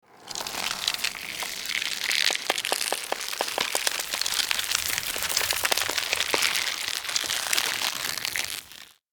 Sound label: Magical forest growth vegetation nature